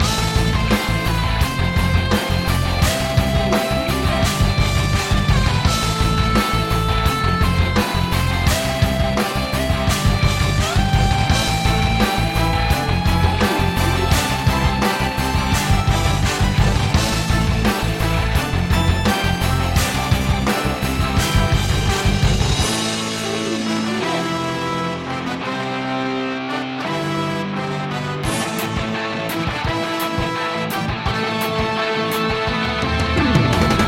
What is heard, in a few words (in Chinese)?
战斗 PVP